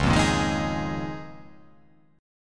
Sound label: Db 6th Add 9th Db down; Db6add9 strummed; JGuitar